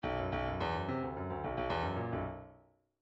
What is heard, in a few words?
bdf midi file grooves funk k funk k